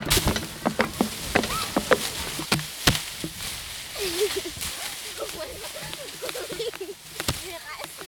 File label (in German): Space Sound